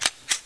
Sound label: colt draw pull slide, weapons; dod 1.3; dod enhancement pack